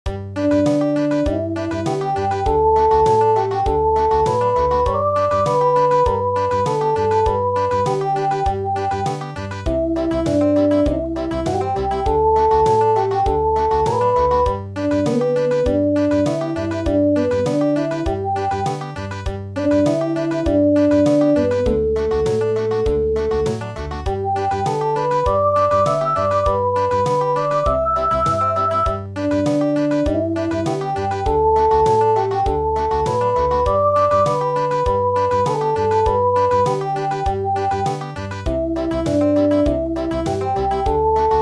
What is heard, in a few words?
Country, midi